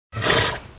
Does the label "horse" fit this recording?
Yes